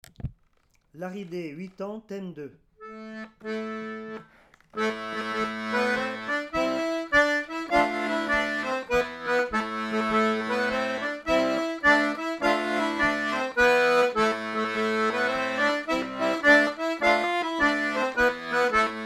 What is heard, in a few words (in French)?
Laride 8 temps Theme